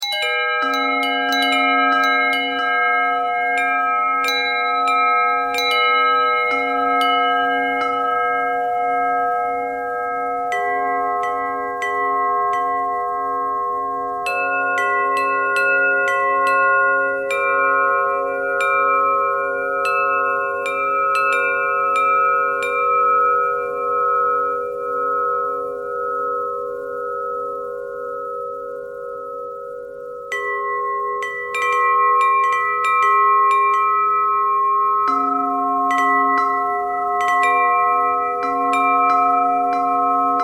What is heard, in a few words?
bell bell thread pentatonic, bells